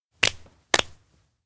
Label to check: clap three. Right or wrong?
Right